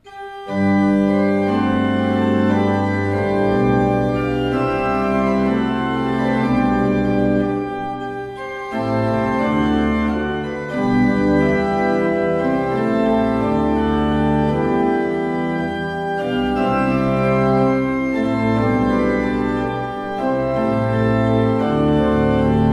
Fuehrer, gebrauchtorgel

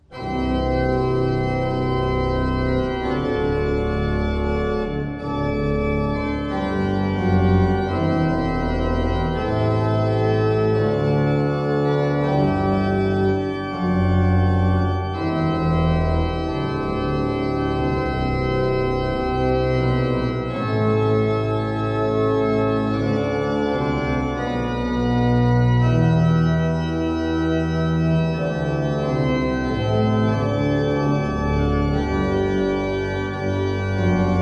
Walcker, gebrauchtorgel

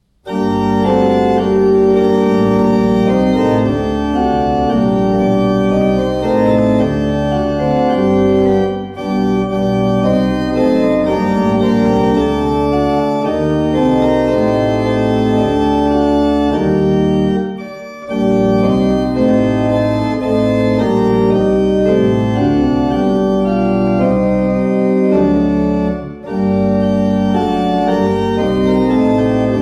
Walcker, gebrauchtorgel

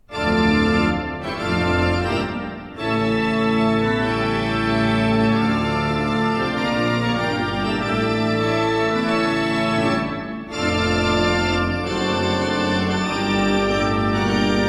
Euler II P, Orgel

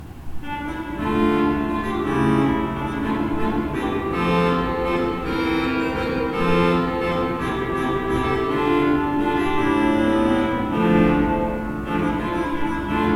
Beckerath, gebrauchtorgel

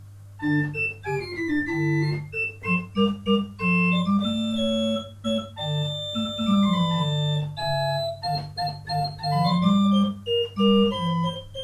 Verschueren, gebrauchtorgel

